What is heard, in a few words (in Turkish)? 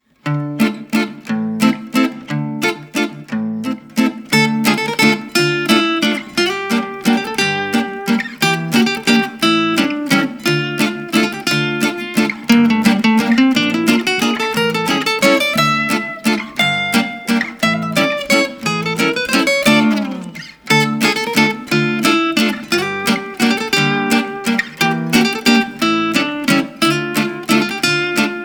(Gitar Versiyon)